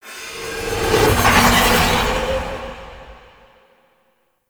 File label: Eye Ring Settle; Ambience; Gameplay; Warframe; Orokin Moon